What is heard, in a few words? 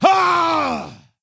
attack; Skadi The Ruthless